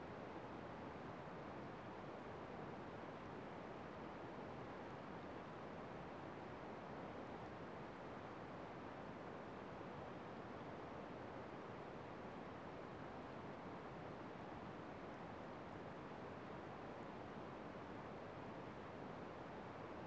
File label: taughannock flats